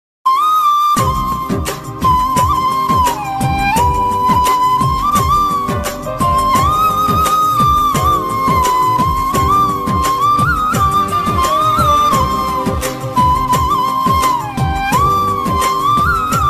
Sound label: bgm ringtone love bgm ringtone